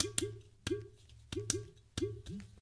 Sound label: village, jungle